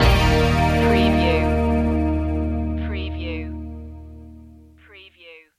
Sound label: storm surfin stinger